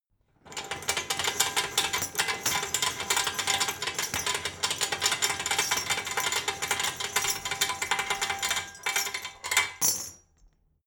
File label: metal chain rattle long